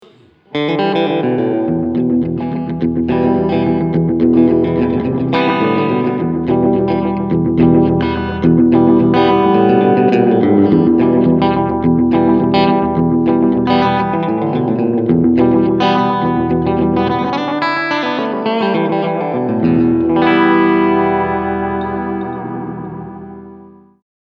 Echosex Short Delay

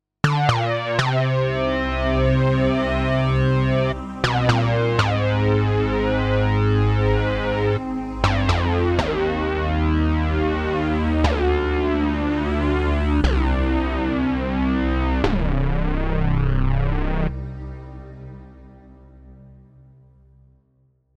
Kobol Laserharp

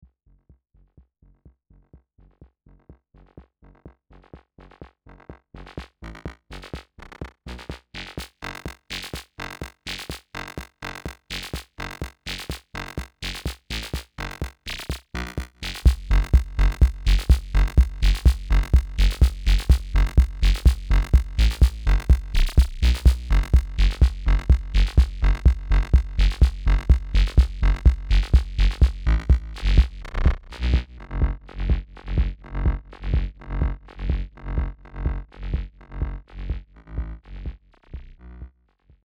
Mix Bus Filter

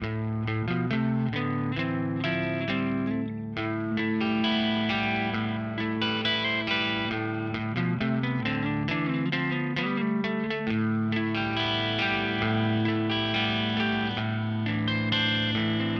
Neural DSP Quad Cortex PV505

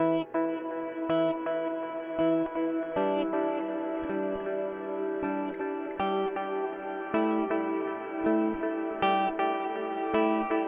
Eventide Blackhole Delay

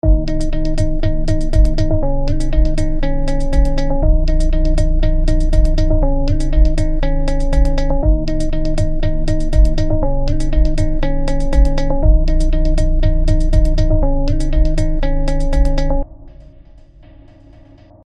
Motion Rhythmer; ShaperBox 2

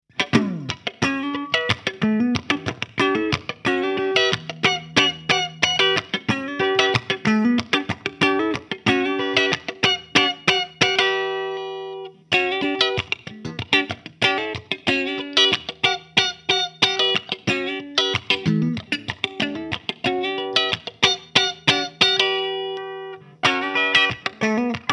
Wing 800 Int PB Clean; Mooer GTRS Guitars

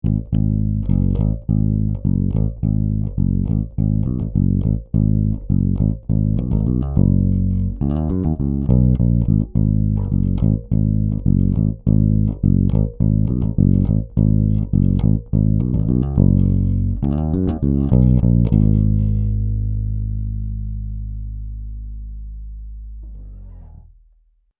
Sonic Farm 2di4 Pentode Low

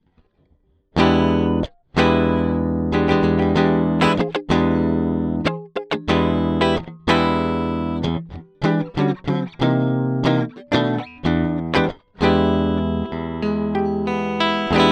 E Guit Dry; Chandler TG-1

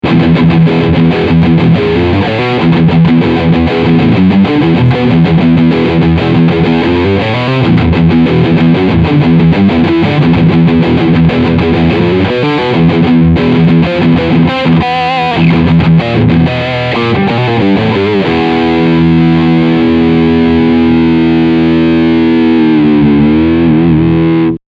Full Gain Riff

